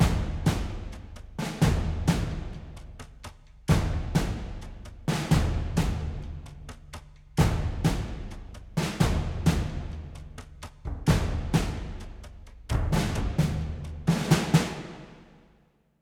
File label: Music Battle